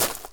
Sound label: gravel; firefight